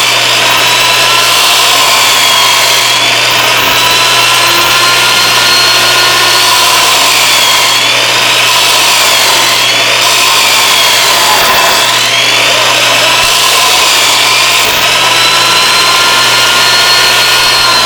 metalsaw

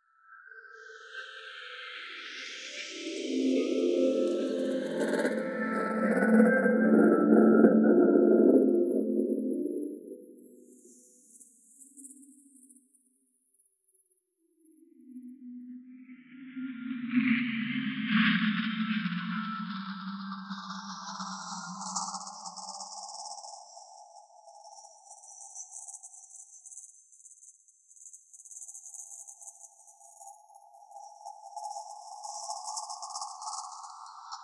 Shuttle

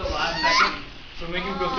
girl whoop

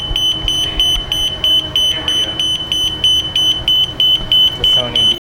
door sensor

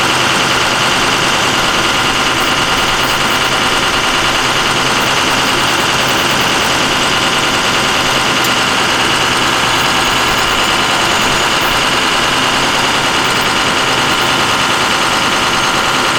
diesel truck

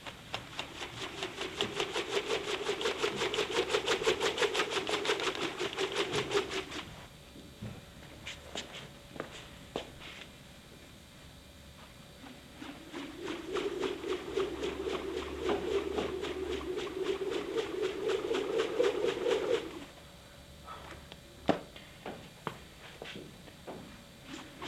jumprope

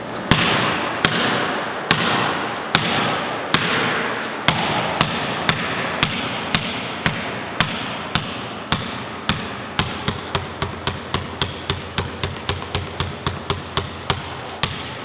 sound basketball

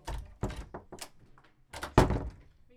open door; Thomson

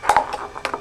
beat

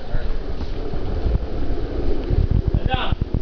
quiet awh

